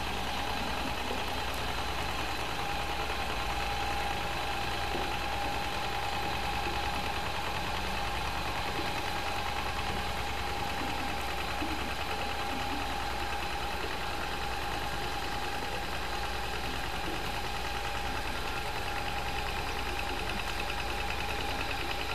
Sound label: small diesel